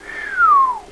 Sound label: whistle; WINDS